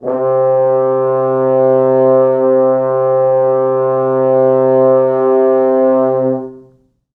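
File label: 4 French horns sustain C2; East West; Quantum Leap Horns Sax; 4 FrHorns SUS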